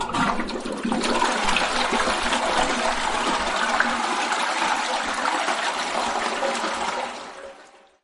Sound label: flush